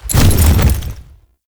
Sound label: Horse Jump Barrel Roll; Vehicles; Foley Actions; Warframe; Duviri